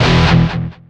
pickup guitar riff; Left 4 Dead 2

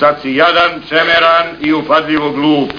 glup; Counter-Strike Global Offensive

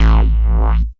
gun spheres; AssaultCube; Alien Stars

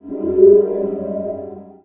replica spawn; Emperor - Battle for Dune

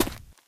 concrete, footsteps run; Left 4 Dead 2

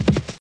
horse gallop; Mount&Blade Warband

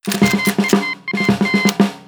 diplo action faction; Hearts of Iron IV Death or Dishonor

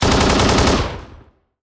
mechanized units mg fire; Hearts of Iron IV Death or Dishonor

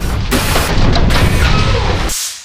dog rollover servos; Garry's Mod; hl2

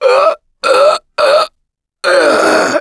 Choke, russian, Survivor Voice; Gambler

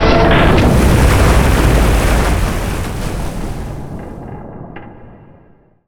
ships destroyer death; Hearts of Iron IV Death or Dishonor

